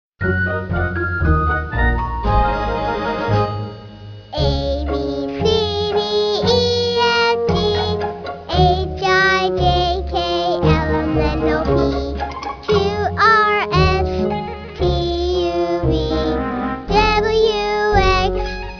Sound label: Abc Song